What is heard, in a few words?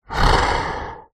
horror creature monster deep close breath; zapsplat